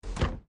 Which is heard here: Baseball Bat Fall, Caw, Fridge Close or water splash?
Fridge Close